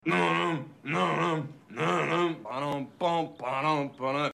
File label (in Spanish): cantando mal